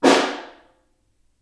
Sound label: SN Far, Snare